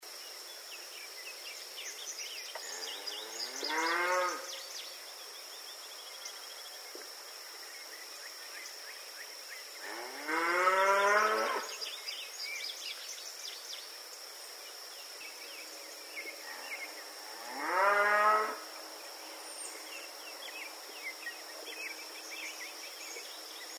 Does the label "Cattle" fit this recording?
Yes